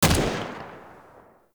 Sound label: Shot, Weapons; Warframe; Braton